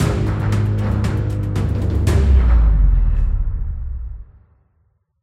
Combat End; BIOMUTANT; CombatFatherWolf End

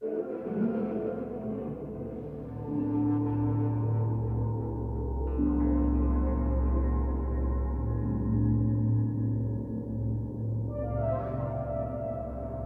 Hideout Interior Ambient

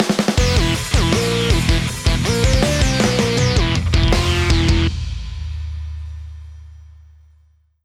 Win Jingle

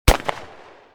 Shotgun Shoot

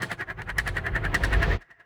Bumper

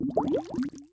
Bubbles; Doodle Jump; 3DS, Android, Arcade, DS, iOS, Mobile, Xbox 360

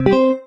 Ui Toggle Voice Tripe Note Enable; Stereo

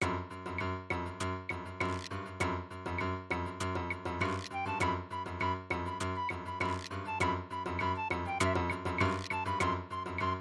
MIDI BGM

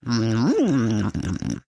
Disco Chewing; cut the rope time travel; android

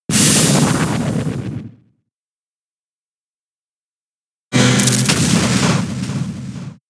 Powder; Pokemon; gen 6 attack moves; XY ORAS

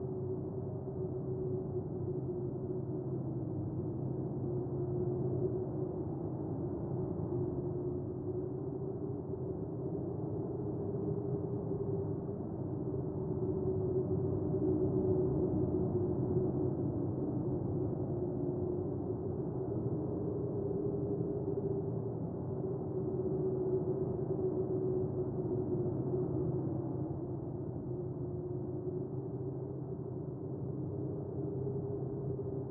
ambience inside house; Aragami

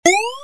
Spring Arcade; Doodle Jump; 3DS, Android, Arcade, DS, iOS, Mobile, Xbox 360

